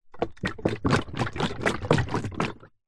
industrial power steering fluid in plastic bottle shake